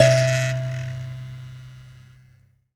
PLK BUZZ, Buzz Kalimba, Africa

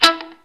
ROCK GUITAR

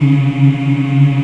African Mm; Roland LCDP11 Africa VOL-1

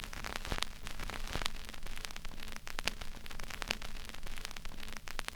Crackle, Vinyl Noise

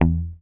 HOF MUT E2, HOFNER MUTE, Bass

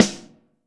MUDDY SN, DRUM KIT; Drum Kit1

